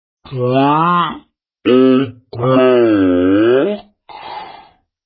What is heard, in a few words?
clock